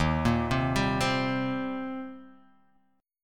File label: D sharp 6th, D sharp, down